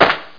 SAND; 10,054 sample rate

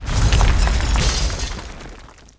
Crushing Tile; 1944 Battle Of The Bulge

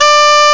tenorsax; Epic Collection 3, The; Epic Marketing; muzak

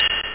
beep; total domination

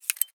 button; Immortal

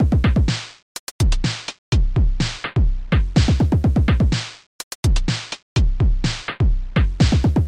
rhytm; amos

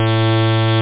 beep; OctaMED SoundStudio V1; rave

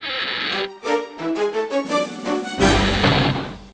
ORCHESTRA SEQUENCE; The Interactive Alphabet

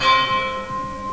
bell, percussion instruments; Sound Effects for Multimedia - Volume 1

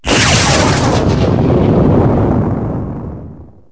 explo, sfx; urbanchaos

